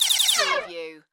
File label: Laser Shot